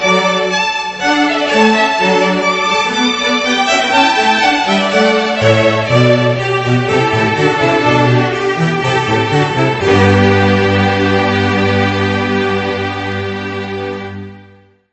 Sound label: Fugue; Classical